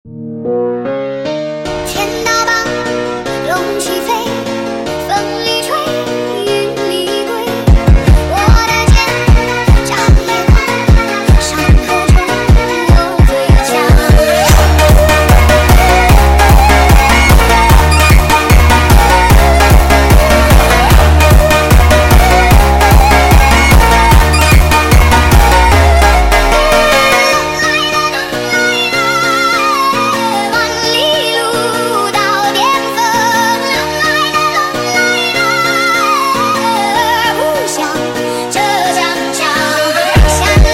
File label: sped Up, funk